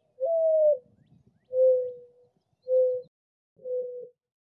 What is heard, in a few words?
dove